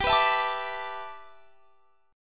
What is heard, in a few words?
G 6th Add 9th G, Guitar Drop D; x,22,21,21,22,22; down